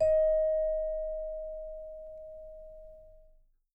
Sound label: D#5, Percussion & Harp; Miroslav Vitous